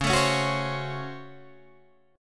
Db Major 7th Suspended 4th Sharp 5th Db Guitar Open G